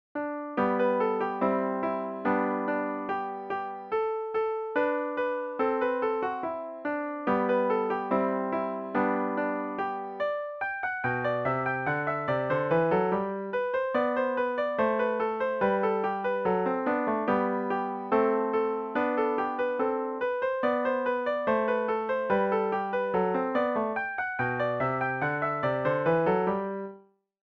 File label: arkansas traveler piano